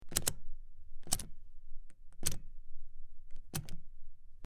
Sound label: Button Press In Out, switch clicks